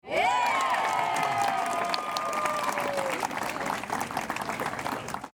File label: crowd cheers applause